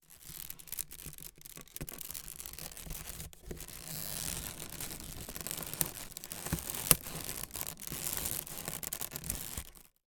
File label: foley baskey small movement stress squeak